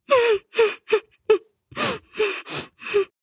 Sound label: moan; Devour